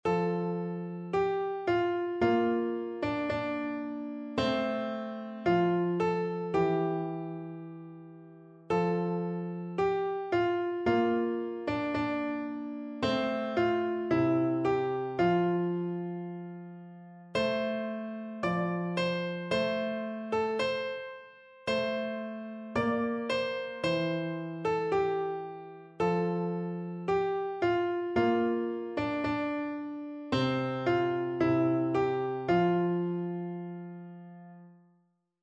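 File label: electric piano; nearer my god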